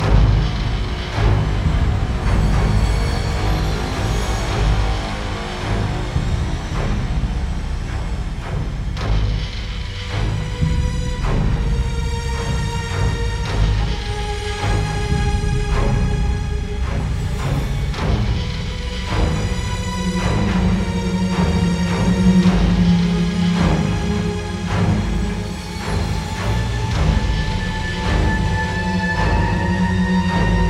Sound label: Suspense, Loop, 107bpm, Horror Sci-Fi Music; Dead Ark